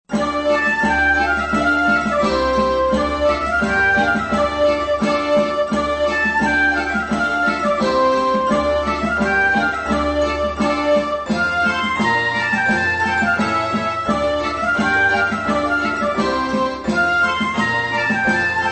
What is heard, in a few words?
Tavern; Azural Kane's UO Juke Box